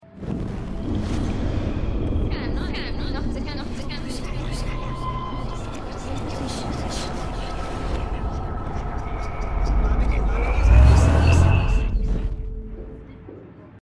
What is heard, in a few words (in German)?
zurueckspulen; life is strange